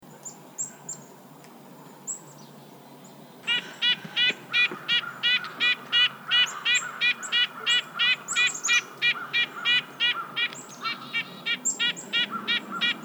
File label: Red-breasted Nuthatch, Sitta canadensis; chickadee nuthatch titmice